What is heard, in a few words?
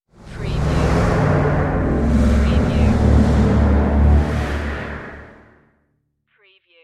Dark Production Whoosh